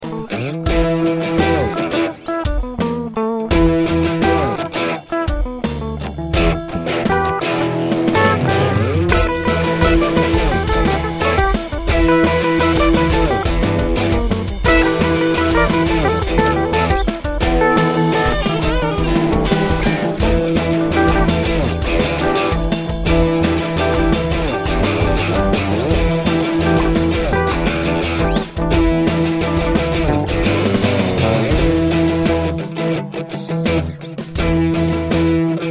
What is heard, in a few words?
dissonant Rock